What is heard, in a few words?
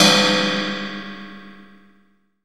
OLD CYMBAL; Zero-G; Funky Elements